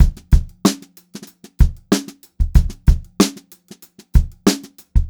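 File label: STRAIGHT BEAT, Funk-Groove Drumloops; USB Soundscan vol.38; AKAI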